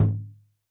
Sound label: Taiko, Yamaha DTXpress-II, Perc